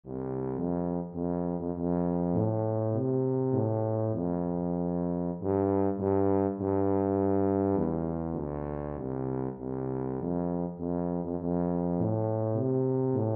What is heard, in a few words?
wheels on the bus, tuba